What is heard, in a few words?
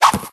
chocolate box appear; Candy Crush Saga; bingo